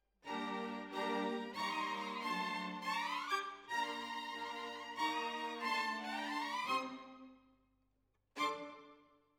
Violins Over

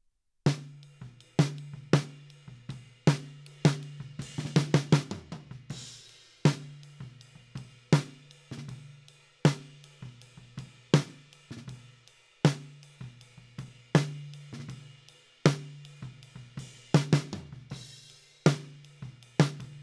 Snare Top, 160 BPM

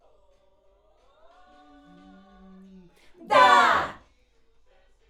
Schoeps AKG, piano

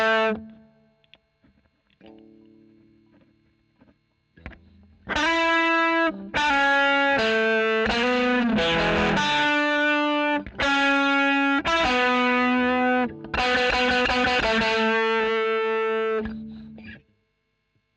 E. GTR Royer OD

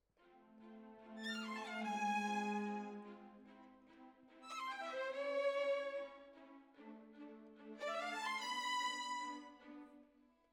Violins, jazz for piano

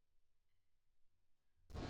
Drums Verb

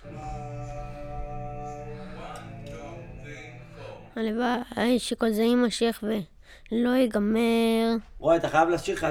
VOC

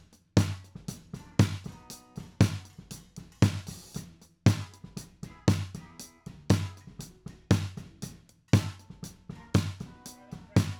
Snare T

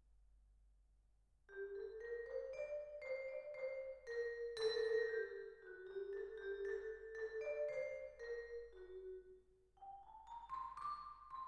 Twin R Vibra

